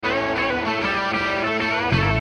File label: musical long door